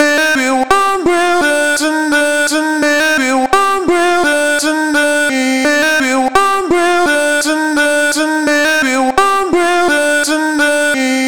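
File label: vocal chop, 85 bpm, Cm; Slate Digital Omnivox Vocal Sample Pack; Chops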